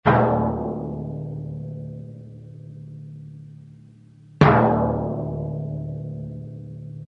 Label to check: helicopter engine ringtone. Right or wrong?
Wrong